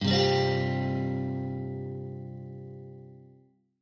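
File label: Csus4, Guitar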